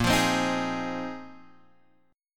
Bb 7th Suspended 2nd Suspended 4th, Guitar, Open G